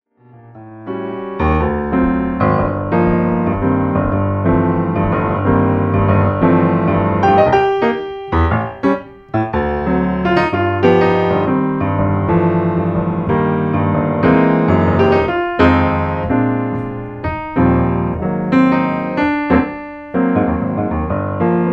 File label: Strand Solo